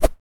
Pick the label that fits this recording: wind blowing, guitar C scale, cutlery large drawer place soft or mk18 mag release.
wind blowing